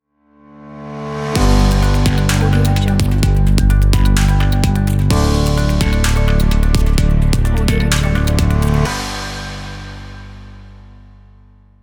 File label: tech and inspiring logo